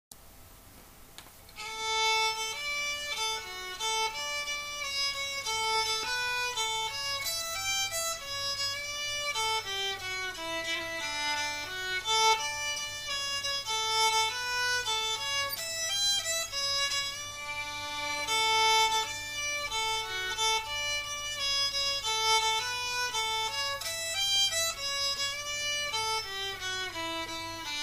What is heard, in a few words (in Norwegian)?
Fantkarl langsom